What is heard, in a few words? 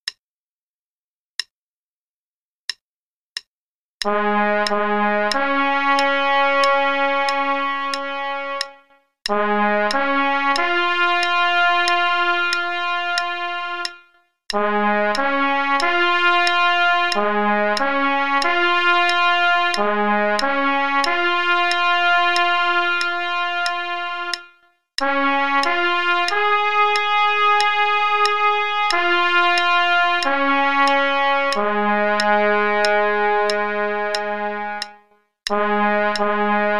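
Taps in Eb, quarter note=92, trumpet; Play-a-long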